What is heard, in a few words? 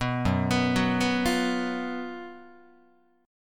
F sharp Major 7th Suspended 4th, Guitar; F#M7sus4 arpeggiated